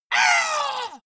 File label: monster hit high; schreie